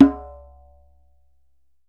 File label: DJEMBE, African Drum